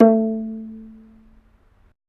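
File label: African HARP A 4; AFR. HARP1; Heart of Africa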